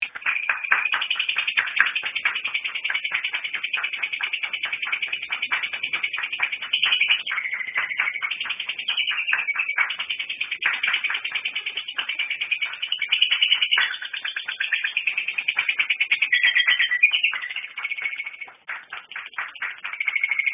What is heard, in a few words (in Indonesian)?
audio lovebird